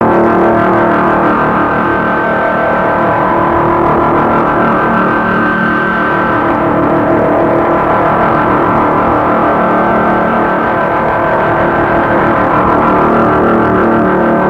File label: Drone